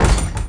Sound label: locked metal; left4dead